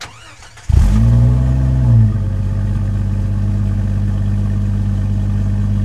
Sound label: Jeep Wrangler Start Idle Loop; Trucks